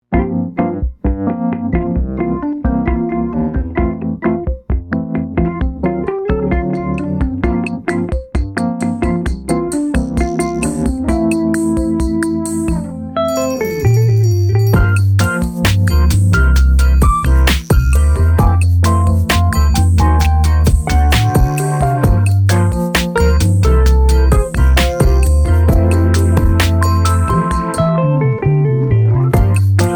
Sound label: wurlybird sweet and low